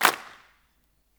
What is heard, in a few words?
GROUP CLAP, HANDCLAPS; Spectrasonics; Heart of Africa